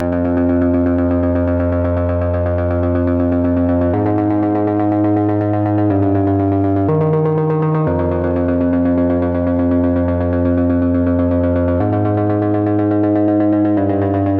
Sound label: 122 BPM Riffs D maj C min Tonic F; mmb studio soundtrack essentials